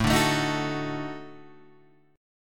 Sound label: Eb Minor Major 7th Flat 5th